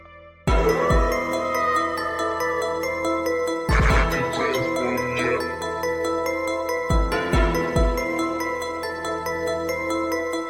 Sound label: So Scary Tone